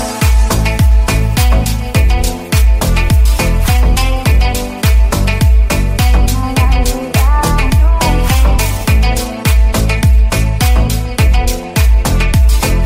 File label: English Music Ringtone